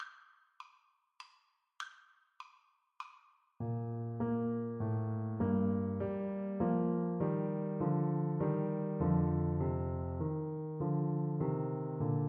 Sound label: minuet, trumpet